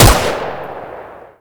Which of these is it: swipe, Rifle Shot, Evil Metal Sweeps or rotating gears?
Rifle Shot